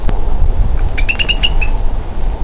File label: kiki; National Marsh Bird